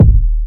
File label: kick drum single shot d sharp key; kick drum samples